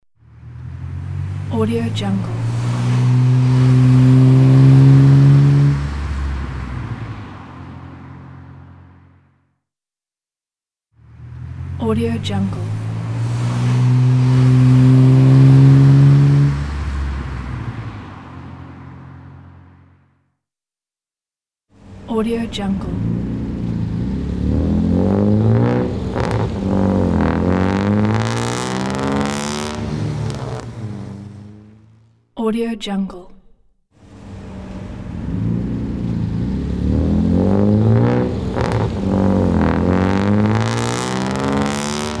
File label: car pack; soundfoodsfx